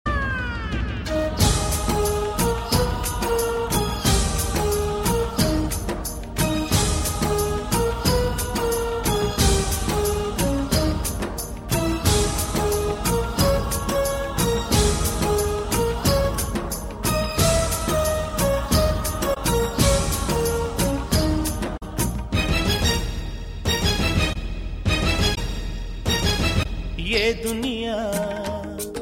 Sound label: tone of india; Country